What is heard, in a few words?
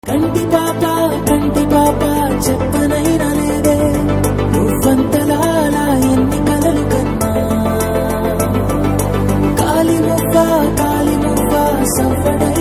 Ringtone; Vakeel Saab